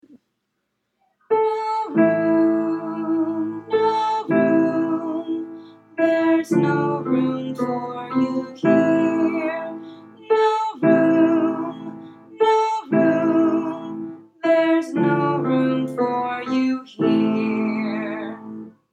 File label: townspeoples recitative; no room